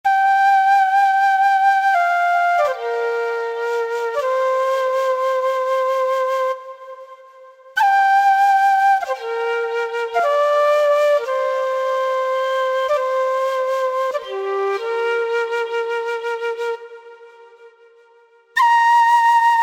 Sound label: Flute; Marcels Flute Shop